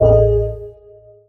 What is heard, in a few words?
low power hint; ZTE Nubia-Z5S-Mini